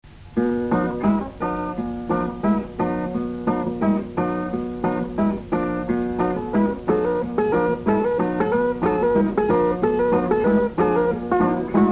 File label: Instrumental